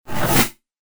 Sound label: wipe